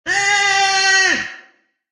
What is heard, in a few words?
goat baa; goat simulator